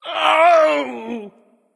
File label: ouch; smite; kumbhakarna